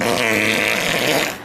fart medium

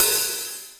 Cymbals

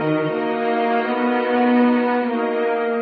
strings, Sad

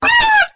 dolphin, Voice; ooh ahh

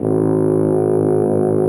low blow, Horns; Notes

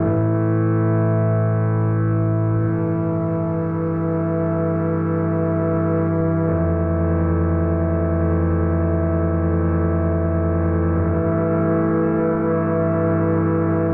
keys warbling, sad loops

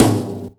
patriot, snares real

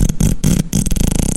fart short

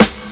raffle, snares real

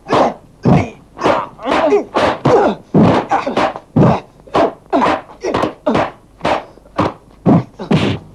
martial arts

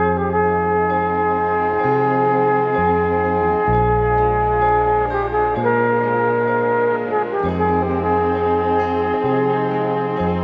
horns and keys slow burn, phonky loops

